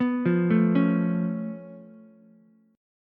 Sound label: C sharp Augmented 7th, C sharp, down, Ukulele; C#+7; arpeggiated; JGuitar